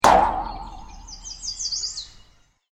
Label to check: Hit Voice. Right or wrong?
Wrong